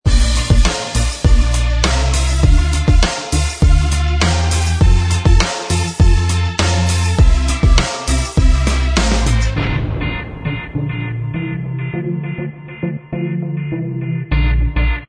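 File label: Instrumental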